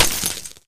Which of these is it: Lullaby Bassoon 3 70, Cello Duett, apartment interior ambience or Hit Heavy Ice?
Hit Heavy Ice